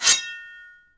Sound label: Sword Out; Civ4 warlords